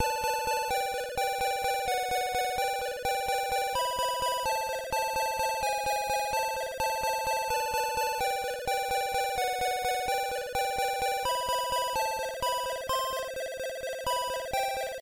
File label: ringtone; One Night Hot Springs